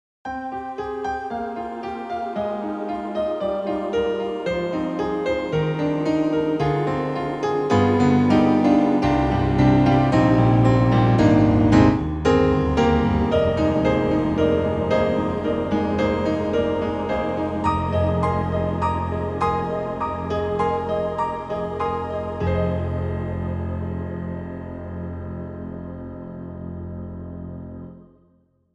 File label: YAMAHA MOTIF-RACK XS, Piano and Ooh Choir